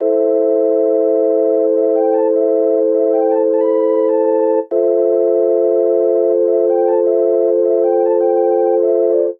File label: Organ A Minor 102 bpm; MIDI Clips; Tonal; Accompaniment; Drive and Glow; Ableton; Factory Packs